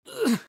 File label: grunt